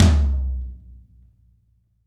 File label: TOM F, LA TOM